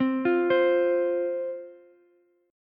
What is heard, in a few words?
F 5th F, down, Ukulele; F5 arpeggiated